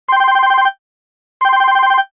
tono sonido despertador digital; whatsapp

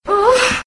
tono gemido mujer; whatsapp

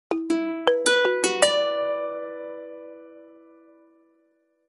tono acordes guitarra clasica; whatsapp

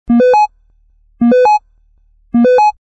tono ascendente descendente; whatsapp

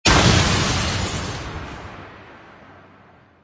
sonido de una bomba y cristales rotos

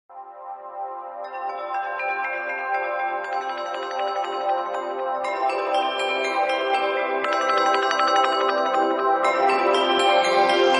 tono despertador agradable para whatsapp

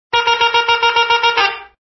Corneta

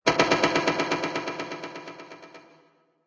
toques de puertas; whatsapp

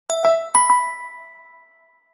notificacion sencilla; whatsapp

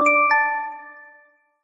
tono notificacion corta android

